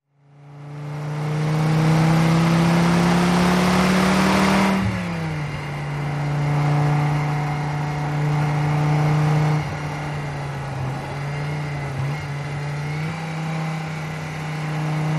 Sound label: Driving Over 100 Mph, sports car